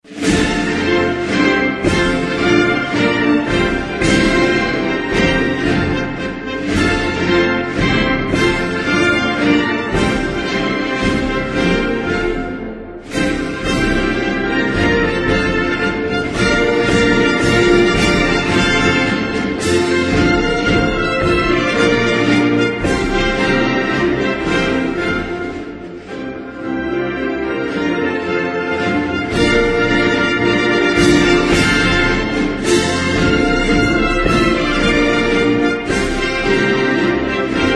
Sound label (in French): Pologne, Hymnes nationaux